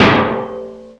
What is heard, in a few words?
LO REZ RADIA, FX; AMG - Vince Clarke Lucky Bastard